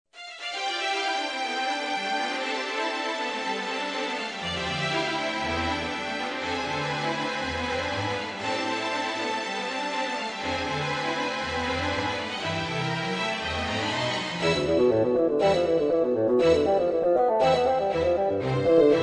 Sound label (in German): fagottkonzert